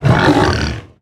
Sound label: Duskboar Play